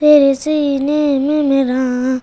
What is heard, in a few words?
CHILD, Indian Traditions